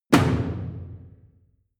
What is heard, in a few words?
drum hit simple small